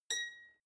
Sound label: guitar clink single high